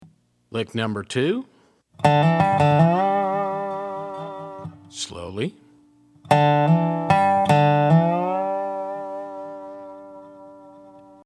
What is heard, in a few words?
resonator pedal, steel guitar; mxr custom shop resonator ii pedal